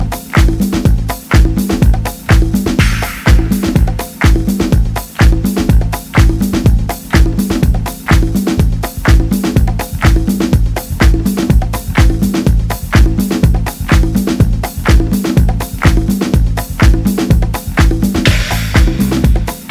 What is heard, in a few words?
Piano Groove